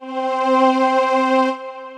Strings Male Voice, String Machines, Roland VP-330; Ableton; Factory Packs; Retro Synths